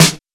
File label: snare